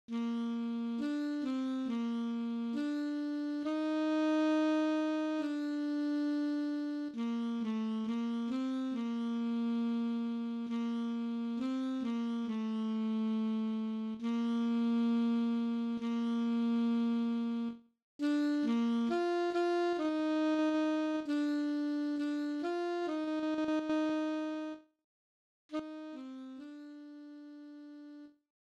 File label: alto sax